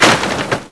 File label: CRASH, 碰撞声音素材